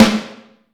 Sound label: Vintage Snare Drum; Q Up Arts - Johnny.C's Vintage Snare Drum